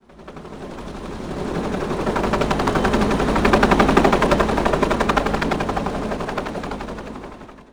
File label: huey flyby; ambience; Soldier Of Fortune II Double Helix; XBOX